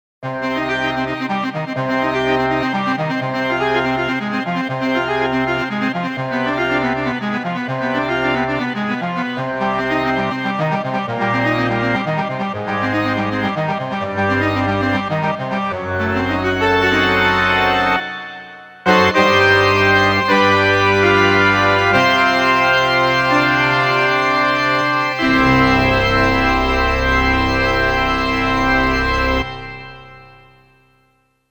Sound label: alesis prestige artist